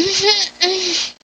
Moan

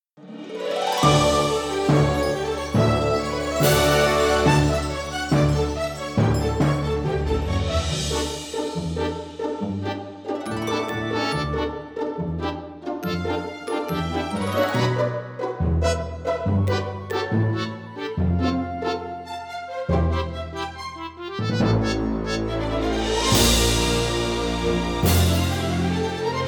Instrumental Cover

